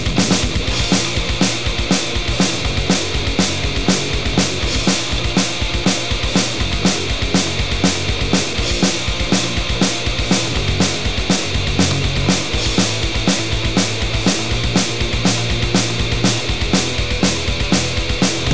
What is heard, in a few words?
punk